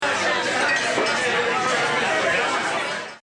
Restaraunt

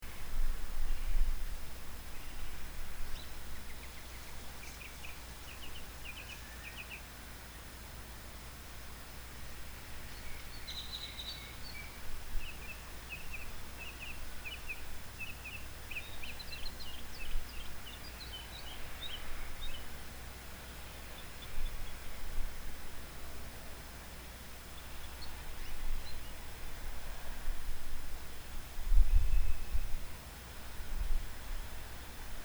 morning ambiance

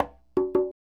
Dumbek

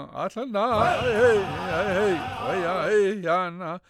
Group heya

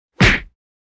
punch face hit; fights bodyfalls; Asian Show Sound Effects

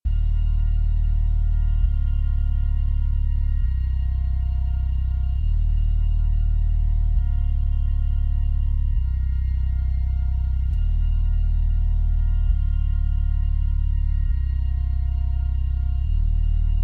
drone; flOw

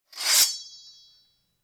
sword shing; fights bodyfalls; Asian Show Sound Effects

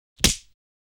slap; Asian Show Sound Effects

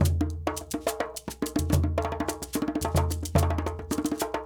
Djoun, Djembe, Shaker

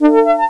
success

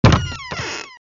squeaky door; Keeping with the squeaky theme, here is a squeaky door; Isn't it squeak-a-rif

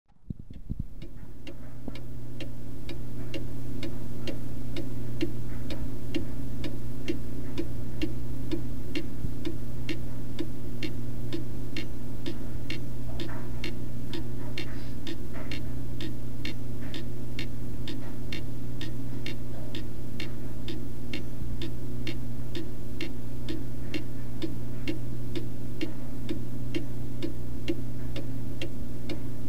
CLOCK, This is the tick-tock of the clock; This is the tick-tock of the clock in my parent's house in wonderful Spring, TX

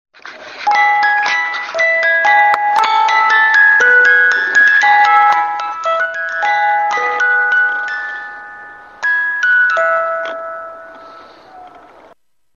musicbox

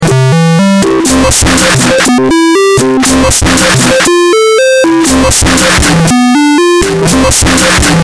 loop

